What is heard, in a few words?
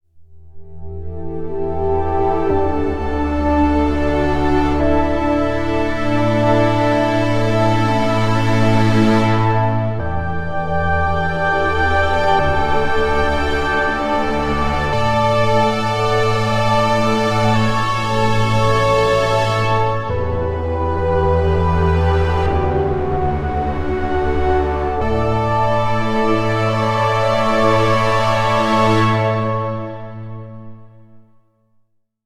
Fields Pad; TEO-5